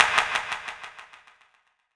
CLAP FX

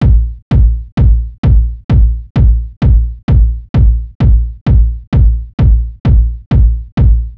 BASIC KICK LOOP 130BPM

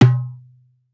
darbuka note

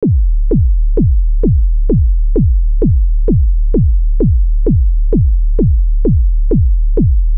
basic kick loop 130 BPM

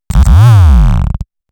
FX BASS

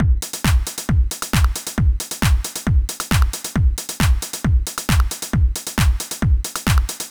Basic Techno 135 bpm

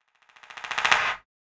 CLAP FX

